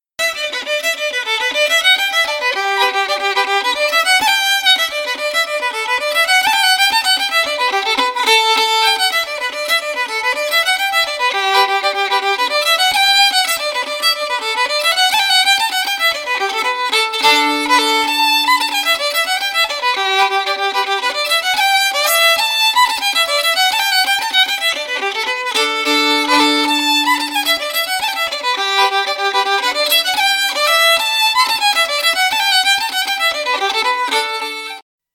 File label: fiddle; SeamusGrantFestival; tune tutor